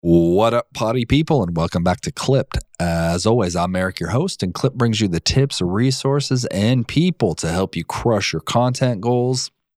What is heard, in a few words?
Stock Podcast Intro